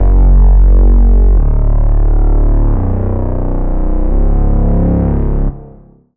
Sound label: Bass; Kitsune; Routed Sound; Vital Expansion - Flame Summoner Vol.1